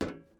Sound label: metal step